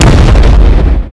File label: shock hit, weapons; quake2